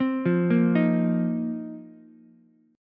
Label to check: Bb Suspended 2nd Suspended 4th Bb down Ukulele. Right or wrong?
Right